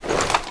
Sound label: foley gear rattle sprint